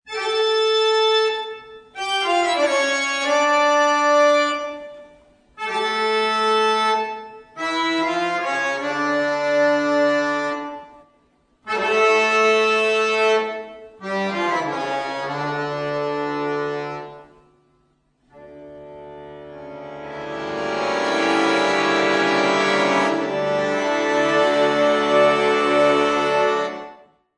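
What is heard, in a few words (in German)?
Toccata und Fuge d-moll, Akkordeon; J.S.Bach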